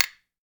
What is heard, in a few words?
SPOONS; Jew's Harp & Spoons